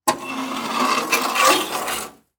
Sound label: Scrapes and Scratches, Metal; SoundBits